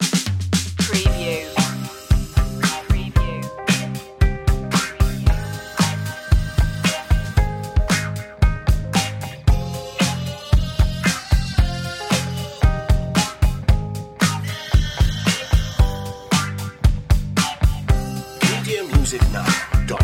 groovy vintage tune short, rhythm and blues

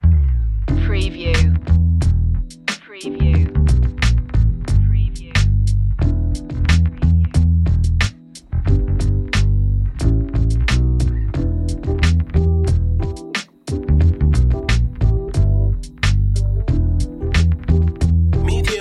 groovy underscore, sugary

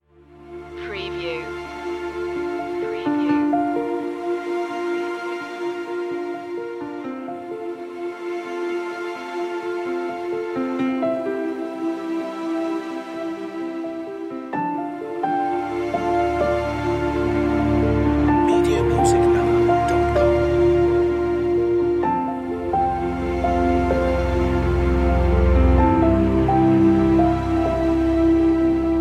passing time, ethereal

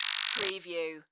Scanner Beam Short; Sound Effects Bible

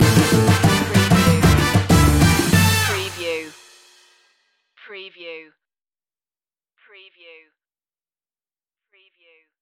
stinger, latin spanish; world influences

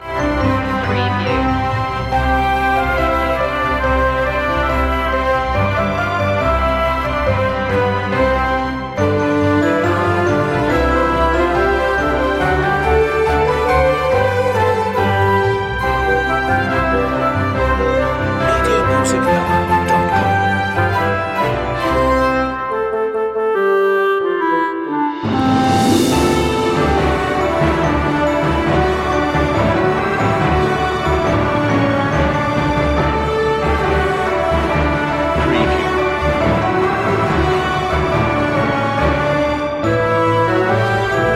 orchestral; deck the halls

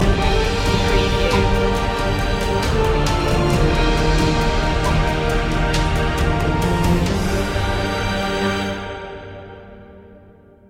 stinger

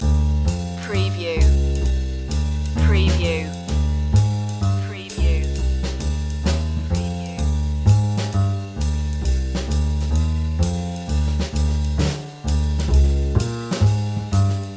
fresh jazz loop, Jazzy Royalty Free Music Loops

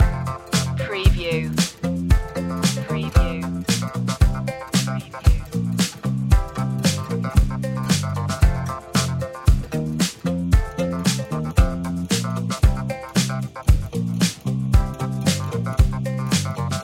groovy vintage tune loop; rhythm and blues

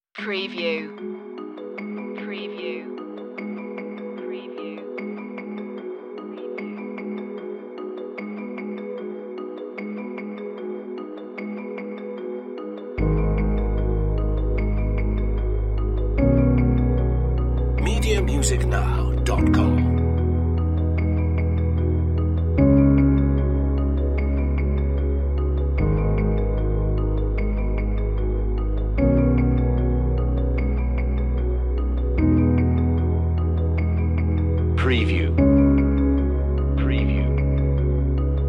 no drums or sound fx; aerial

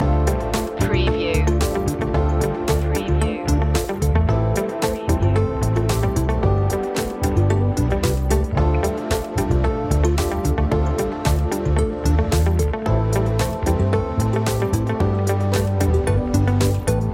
love crush loop, pop, funk

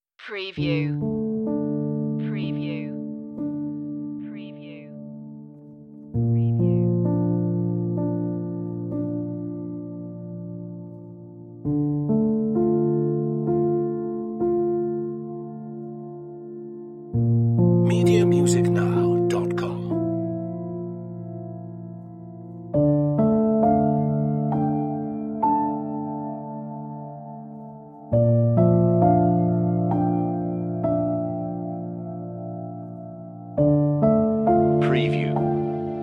ethereal

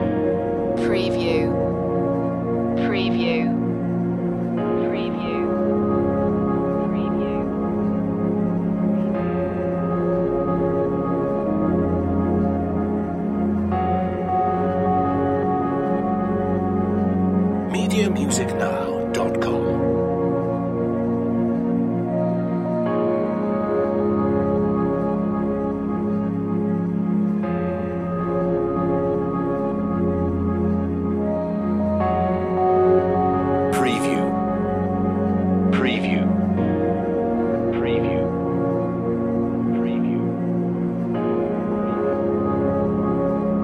beautiful loop; sooth

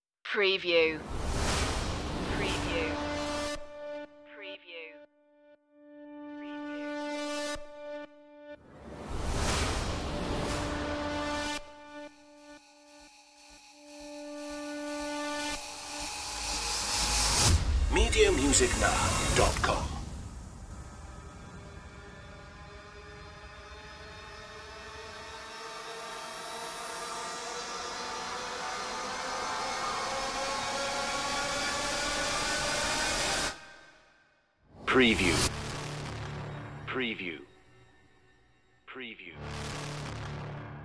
hybrid trailer risers only

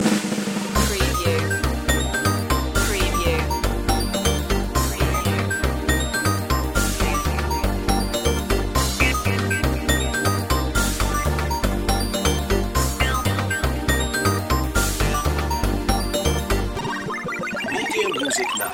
mushroom boogie fast part only, 8 bit

